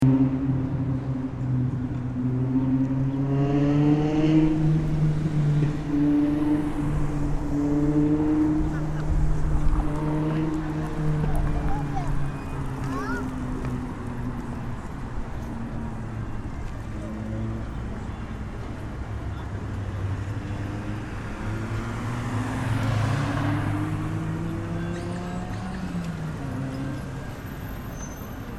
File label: Facing Cathedral at Beatty and Richards